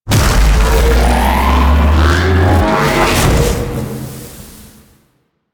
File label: Void Dragon Body Ring Cast; Enemies; Abilities; Warframe; Duviri